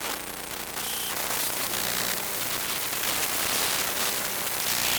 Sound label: Electromagnetic Device, Moving File Data, Glitch, Click, Squeal; Data Processing; 344 Audio